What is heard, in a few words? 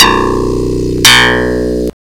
Yamaha DX7; OTHER SYNTHS; YAMAHA FM; STRATOS; Rubber Chicken Software Sample Bank